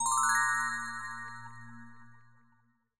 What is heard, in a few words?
click item